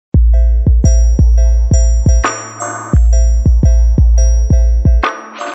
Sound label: ringtones; mafia